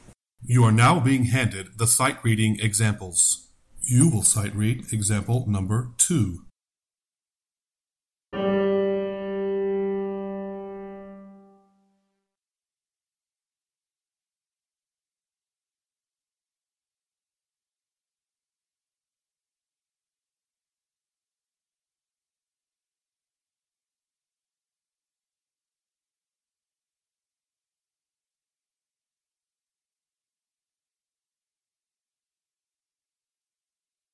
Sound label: Sightreading Example Tenor